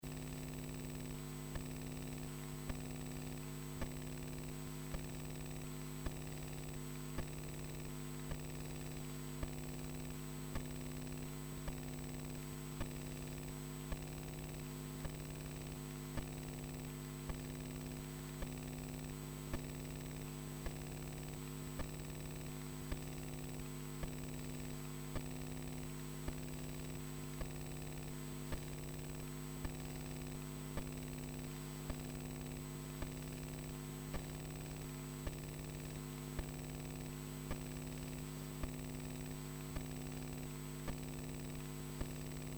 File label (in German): rythmisch